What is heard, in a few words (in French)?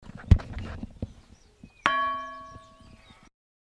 cloche; Quimper